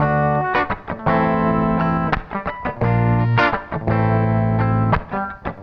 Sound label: CLEAN, CLEAN GTR